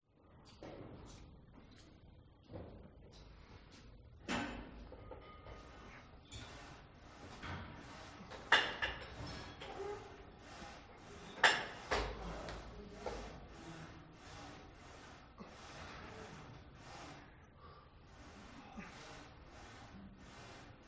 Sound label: Exercise Weight Room; audio hero